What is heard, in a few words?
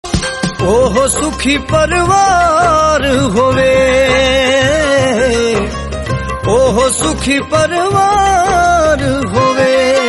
tappe